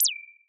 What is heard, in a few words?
Bird Chirp